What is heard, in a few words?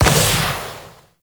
Vial Ability Gas Shatter; Warframe; Warframes; Alchemist; Elements